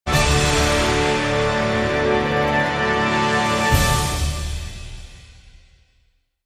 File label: American Fanfare